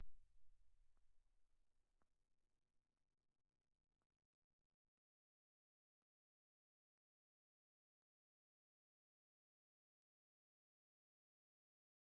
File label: Real perc Phrase; Worms Rumble; PS4 PS5 Switch Windows Xbox One Xbox Series XS